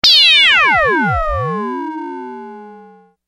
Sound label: ring modulation space sound